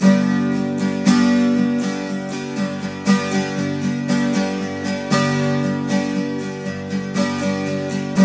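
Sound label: 12 string major G#, guitars; Spectrasonics; Hans Zimmer Guitars VOL-1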